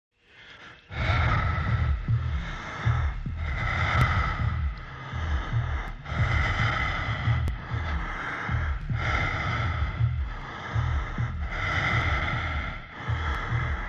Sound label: breath